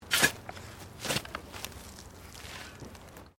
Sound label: Digging Shovel Sand